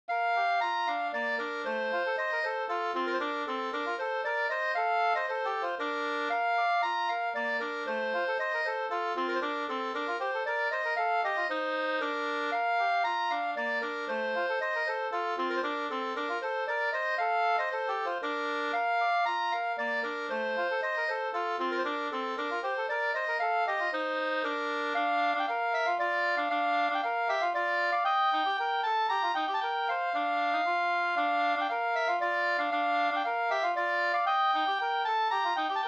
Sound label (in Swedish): polska; Tras-Erkers byxor; D Ohlander SvL Sd27